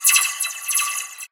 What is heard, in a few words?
scifi weapon blaster accent multiple